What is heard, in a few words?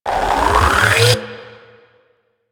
Hunting Bow Prime Draw; Warframe; Weapons